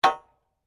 SLEDGEHAMMER METAL IMPACT MEDIUM; Working with a sledgehammer; Hammers; Tools

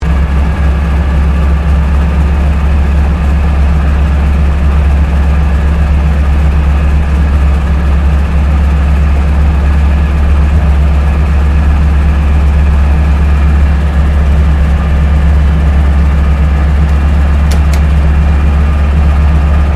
AIRCRAFT AT-6D HARVARD MK III IDLE INTERIOR; Temora Harvard MK III; Airplanes; Vehicles

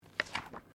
Book Page Gloss Turn; Book movement; Books (Sound Effects:Offices)

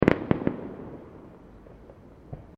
Fireworks Multiple Firecrackers

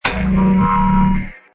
Hi Tech Panel Servo Button; High Tech Sound Effects; Lynne Music

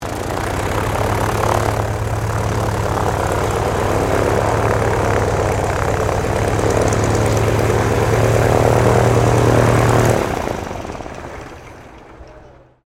AIRCRAFT AVRO 643 MKIA CADET SHUTDOWN, Biplane; 1934; Airplanes (Sound Effects:Vehicles)

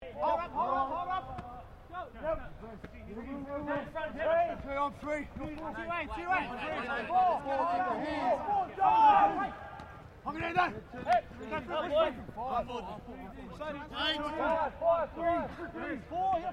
RUGBY LEAGUE TEAM TRAINING; Various rugby sounds; Miscellaneous Sports (Sound Effects:Sports)

